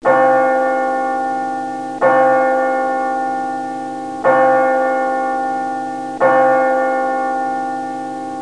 bells; PUZMANIA

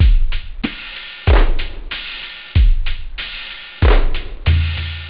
dance, techno; OctaMED SoundStudio V1

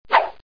flip; Bible Fun Pack; Education Publishing Concepts

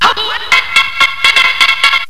acidy, techno riffs; Terra Sound Library

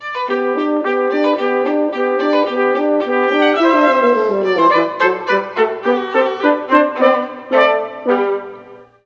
Hn Vla Trp; Juilliard Music Adventure

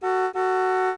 beep; urbanchaos

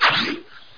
flip

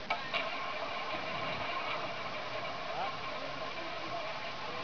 ROULETT2

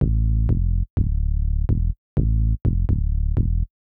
SNTH BASS, Bass; eJay

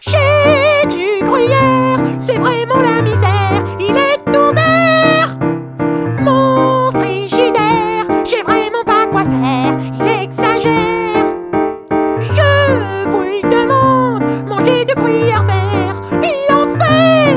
POP CHAN; MOBICLIC 57

